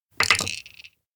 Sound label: bead on plate clink; anemoi